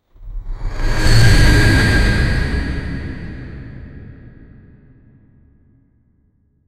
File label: mighty mage sorcerer cast, blizzard